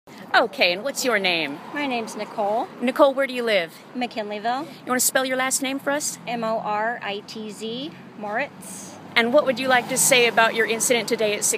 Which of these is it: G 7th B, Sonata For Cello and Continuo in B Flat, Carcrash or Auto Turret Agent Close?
Carcrash